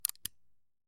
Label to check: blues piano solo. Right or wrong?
Wrong